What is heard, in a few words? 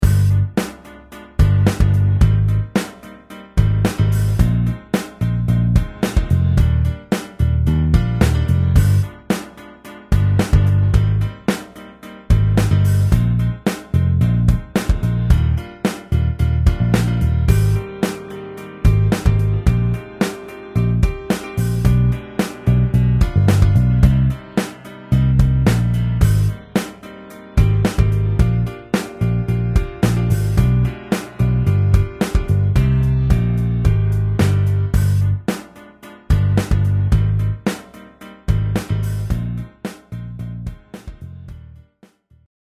Rock Groove Rhythm